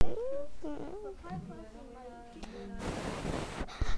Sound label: crowd